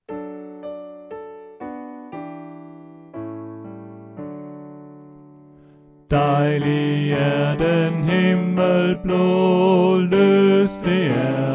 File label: Bas; oevefiler